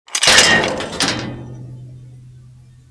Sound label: metal; Mafie 1